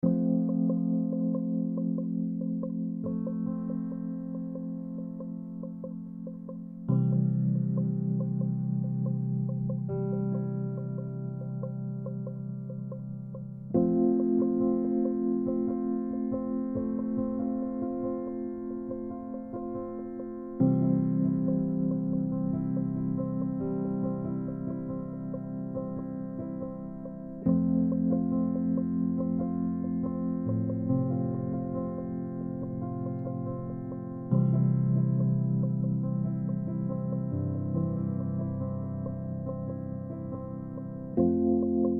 happy relaxing loop, cinematic pads

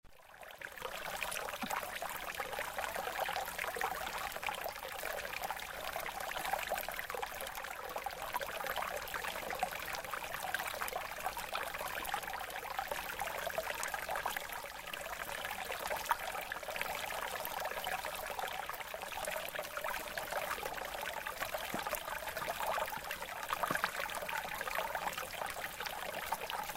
running water gentle sound

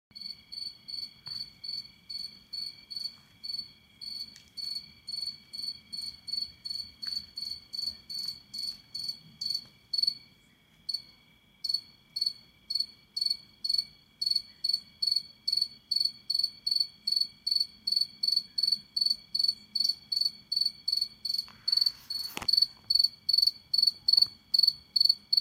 night sound of cricket

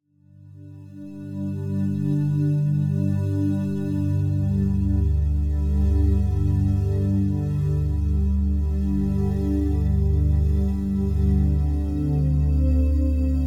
pad gentle and soothing strings background

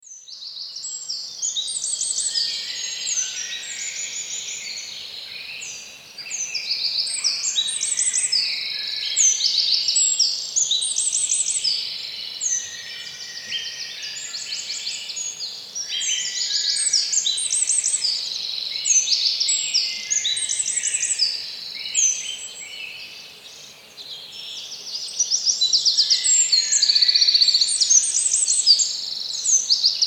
forest ambience morning spring poland, birds

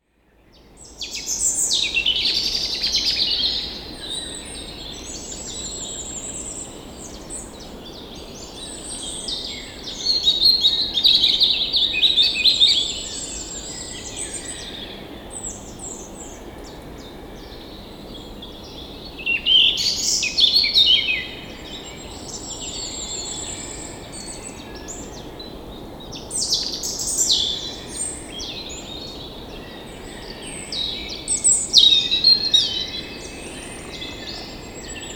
spring forest nature

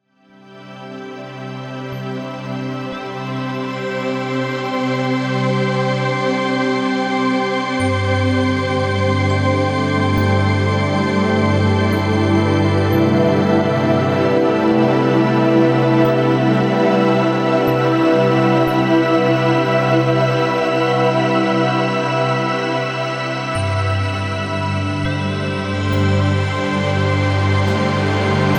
atmosphere soundscape

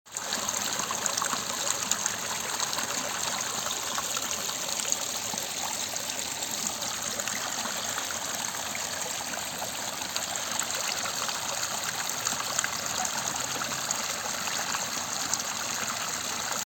nature running water relaxing

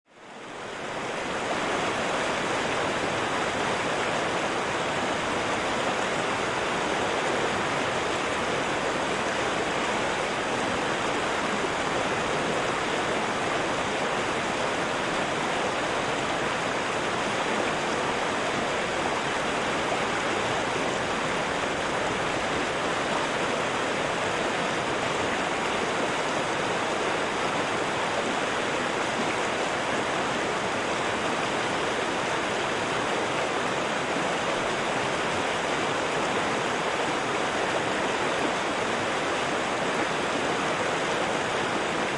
highflow river

